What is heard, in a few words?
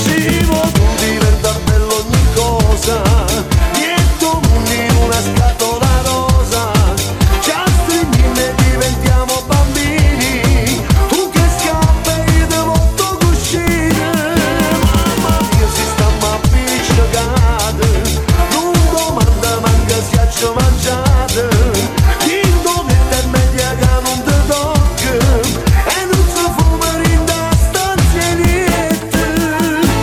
remix disco house dance